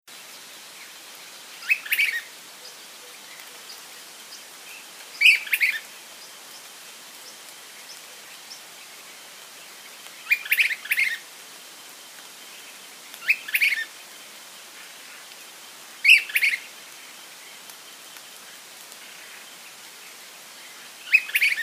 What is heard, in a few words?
Myiarchus tyrannulus